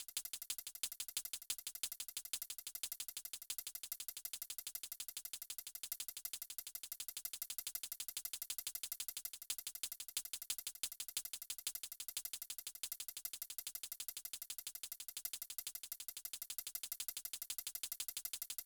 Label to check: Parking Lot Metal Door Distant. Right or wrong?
Wrong